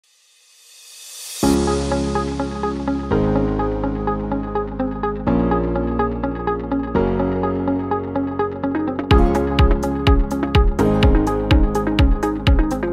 MUSICA CORPORATIVA EMPRESARIAL SIN COPYRIGHT

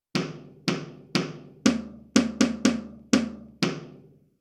Ritmo

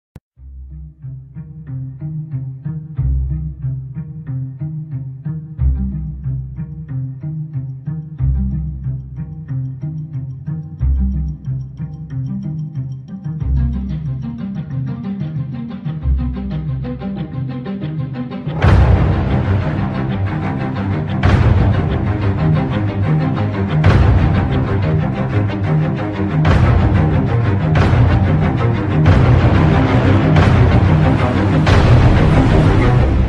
MUSICA DE MISTERIO Efecto de Sonido

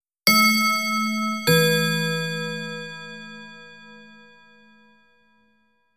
ding dong sonido

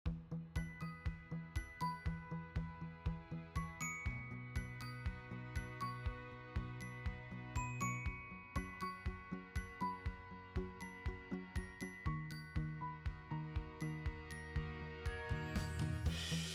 audio de fondo

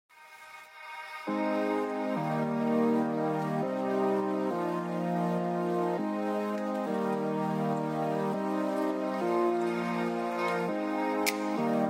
Musica SIN COPYRIGHT

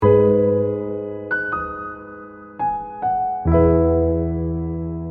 musica para meditar calma relax

